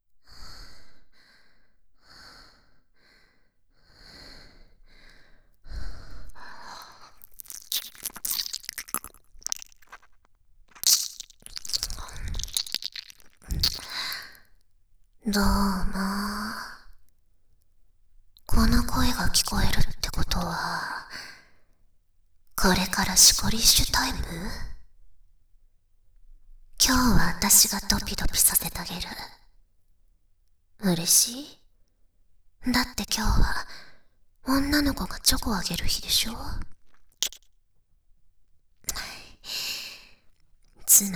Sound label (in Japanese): シングル耳舐め, ASMR; 脳蕩耳舐め; W黒ギャルからのディープな耳舐めプレゼント